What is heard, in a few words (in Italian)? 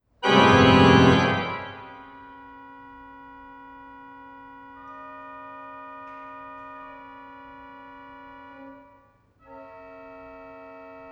Organo